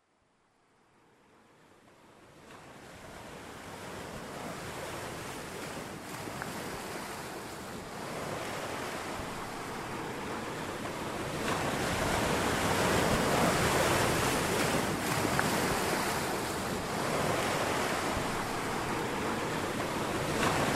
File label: wake up ocean